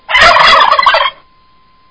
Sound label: Turkey Gobble